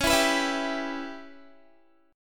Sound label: Db Minor 7th Flat 5th Db down Guitar; strummed